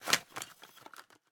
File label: Products Click; MiSide